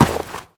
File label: snow, player footsteps